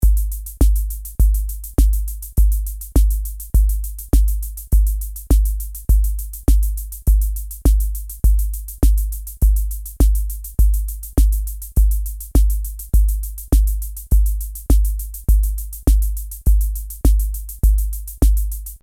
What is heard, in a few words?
Clef Flat Beat